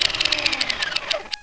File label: turret spindown; XDM